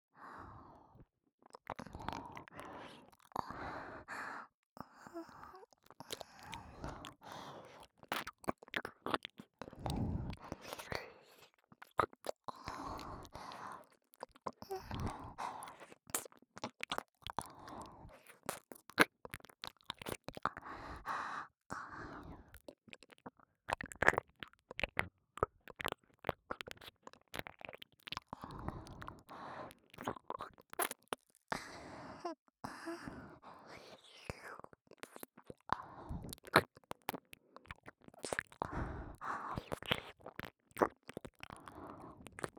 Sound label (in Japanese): (右) 耳舐め; せいえき☆検査病棟; 最新ぺろぺろこれくしょん